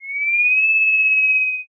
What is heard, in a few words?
kettle